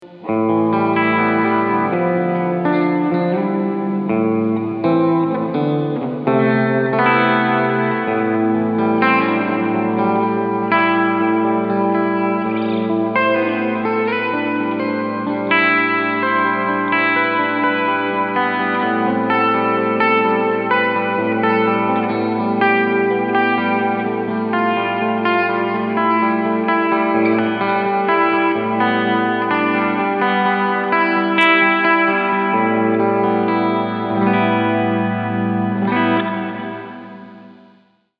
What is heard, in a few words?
Space Commander Reverb; JHS